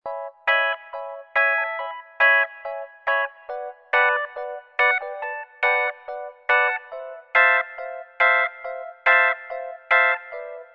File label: EP Major; Loopology; Crying & Dancing; Techno & Dance